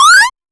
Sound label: a jumping sound for a, cartoony